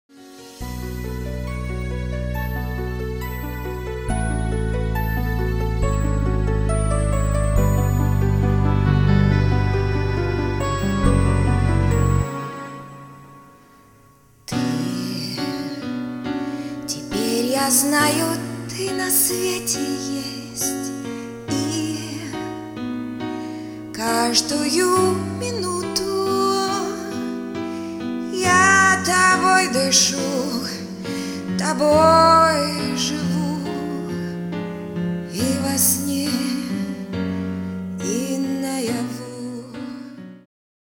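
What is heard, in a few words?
song, Russian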